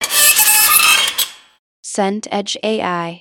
chair wooden scrape

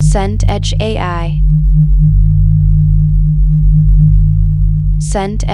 scifi reactor pulse

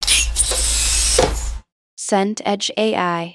bus doors hiss

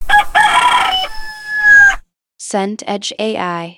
rooster crow

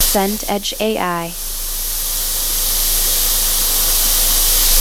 wind howling gap

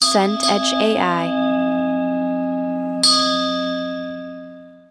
bell church toll

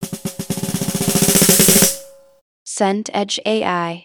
drum roll snare

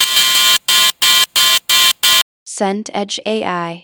alarm scifi

